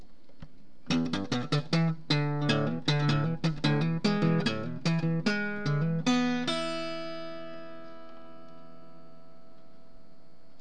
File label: tug steel